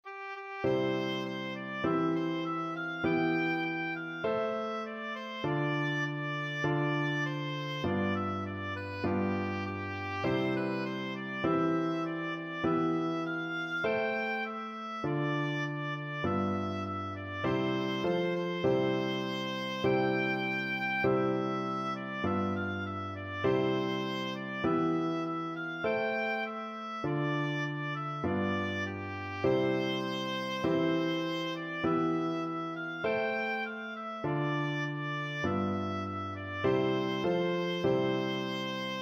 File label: simple gifts, oboe